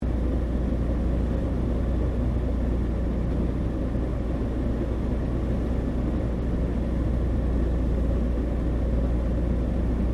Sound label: AIRCRAFT DOUGLAS DC3 FLYING INTERIOR; Qantas 1942 DC3; Airplanes; Vehicles